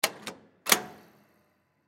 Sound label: Vending Machine Cigarette Vintage Money Knob Push Down Spring Back; Machinery; Industry; Blastwave